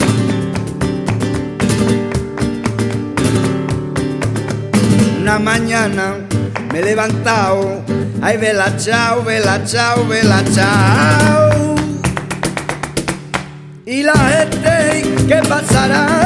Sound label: Bella Ciao Flamenco